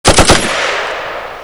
m60 burst

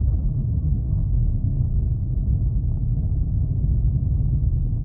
underground

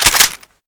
smg clip in; weapons; deluge

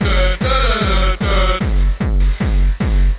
dingle

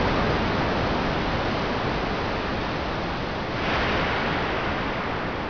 ocean

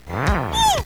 shout switch

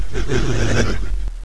fast zombie idle; escape series

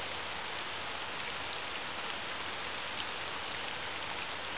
rain; de killers

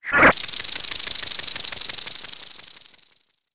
monster reaction

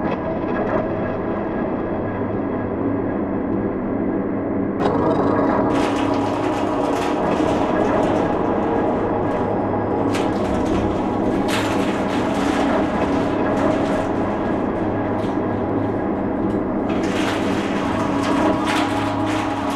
main loop, music

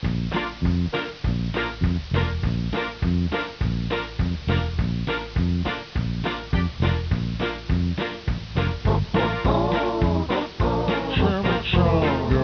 music; hundershade climb

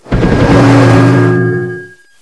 Mass Teleport Target; warcraft3

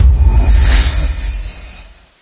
stinger

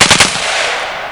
ak74 burst

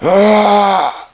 zombie; de compclub

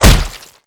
punch hit body; weapons; deluge

